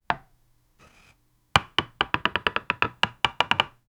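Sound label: chalk engraving on a blackboard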